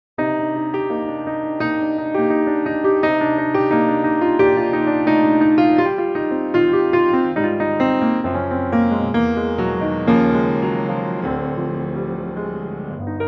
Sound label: Cm piano